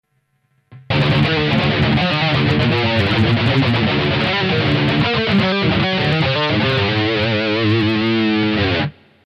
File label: Drop D